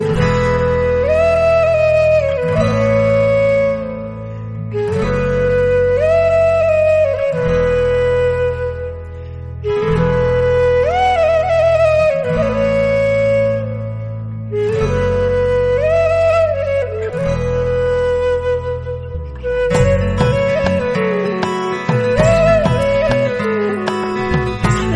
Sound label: flute instrumental